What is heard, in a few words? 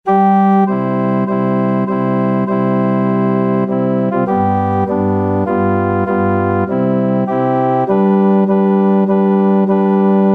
organ